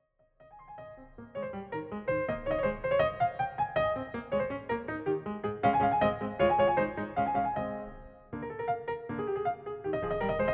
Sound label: Keyboard Sonata in A-Flat Major, Rondo - Presto; No. 35; Hob. XVI, 43; haydn; piano